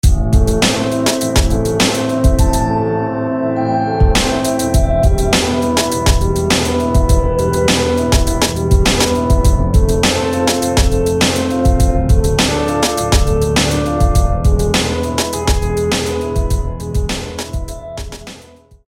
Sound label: Dark echo Piano